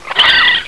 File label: gasp, bug; scourge